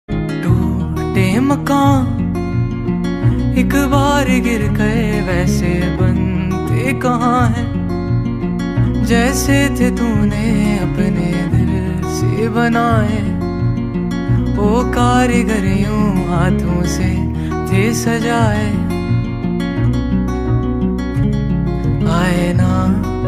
Ringtone

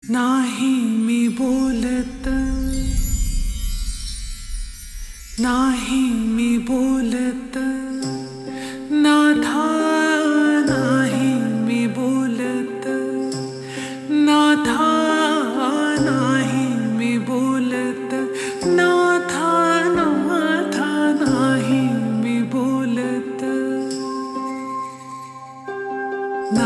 Ringtone Slowed Reverb; sangeet manapmaan

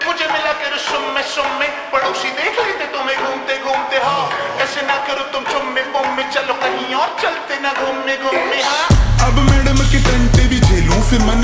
Ringtone Slowed Reverb

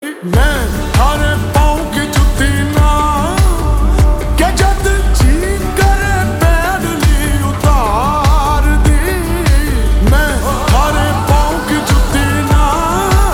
Ringtone Slowed Reverb

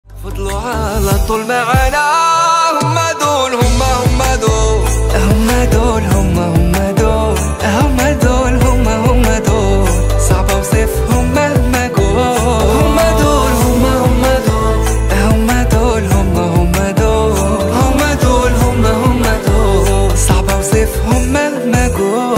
Ringtone

